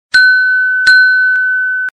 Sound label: doorbell sounds; tinglet high bell